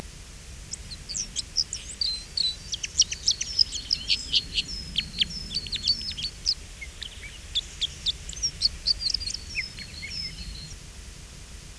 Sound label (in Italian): Crociere comune, uccelli